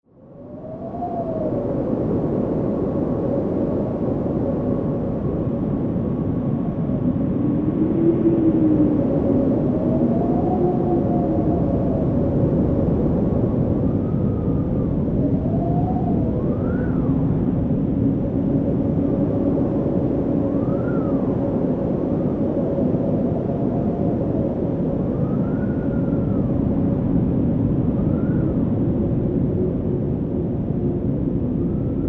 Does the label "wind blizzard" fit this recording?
Yes